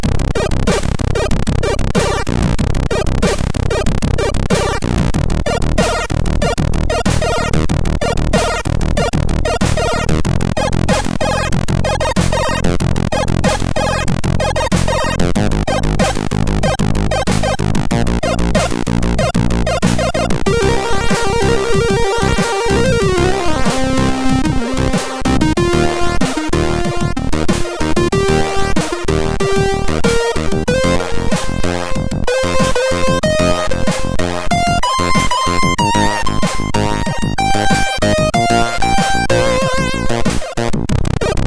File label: sid MOS6581R2; Adrift